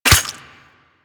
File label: Melee Dagger Impact Flesh